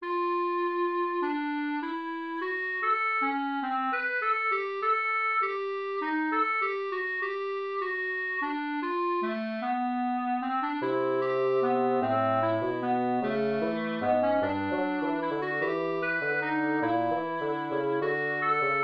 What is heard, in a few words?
fugue